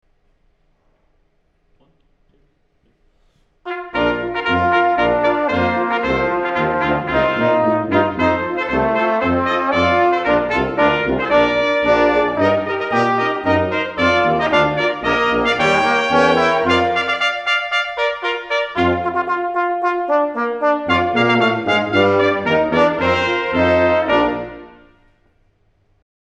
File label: Armor Center March; Ceremony Music